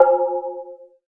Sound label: Lo Brk Drm Chorus